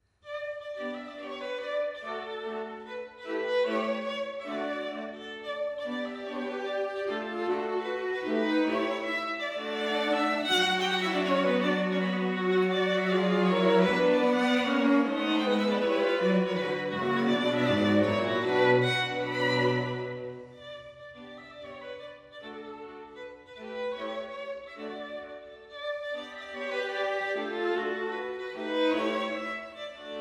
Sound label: mazurka; liadov